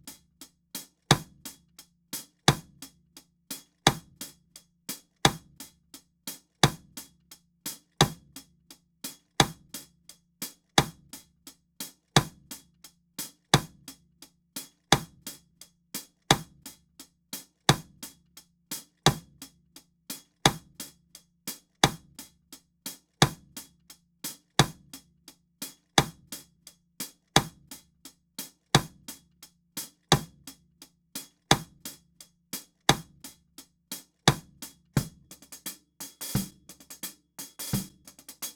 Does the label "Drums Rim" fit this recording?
Yes